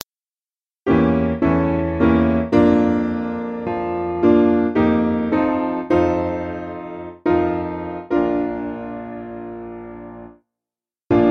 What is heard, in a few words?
Piano